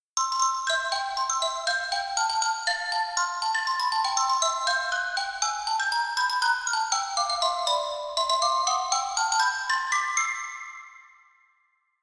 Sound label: Xylophone